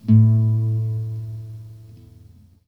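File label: classical solo, guitar; Greytsounds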